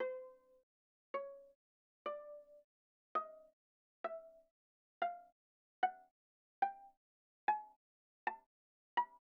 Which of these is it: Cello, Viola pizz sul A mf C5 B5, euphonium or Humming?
Viola pizz sul A mf C5 B5